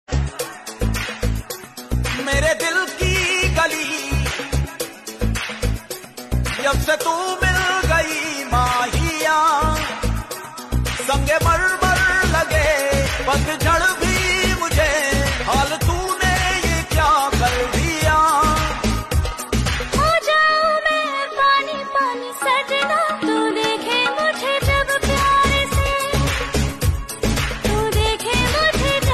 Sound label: Ringtone